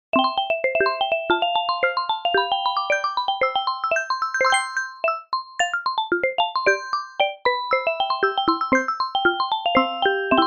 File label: Marimbaphone; Synthractive; syntheway